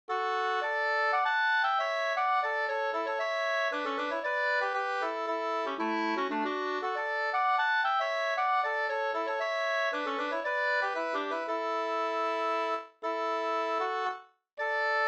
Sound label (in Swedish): polska Systerpolska; Orsa